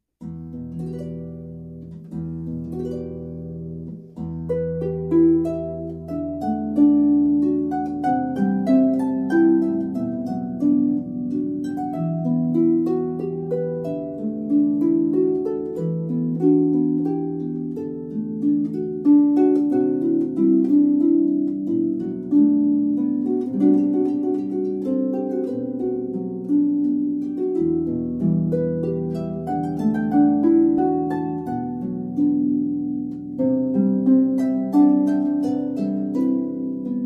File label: celtic Harp Improvisation; professional ceremony music